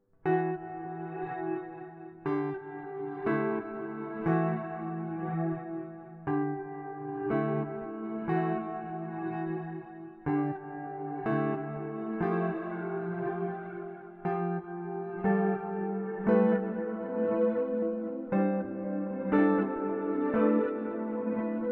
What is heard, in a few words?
Electro Harmonix Oceans 12 Dyna Reverse